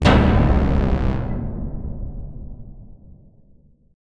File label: Give Up Stinger; Black Future '88